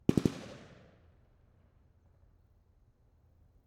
M1919A4 Browning Machine Gun .30cal 200m left behind blanks Triple shots